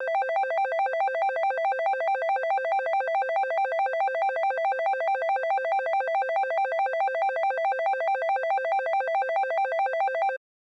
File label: Classic Slot Machine